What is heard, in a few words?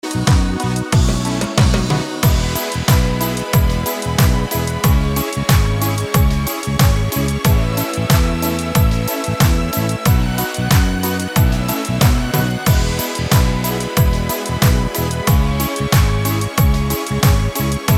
cumbia